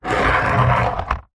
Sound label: foley plastic tub lid scrape short concrete; zapsplat